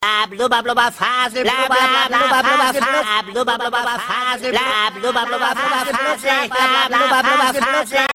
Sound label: blubber